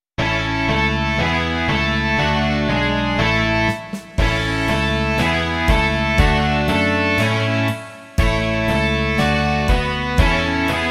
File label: rock